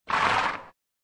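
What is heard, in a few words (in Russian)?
одно фырканье; Фырканье лошади; Лошадь фырк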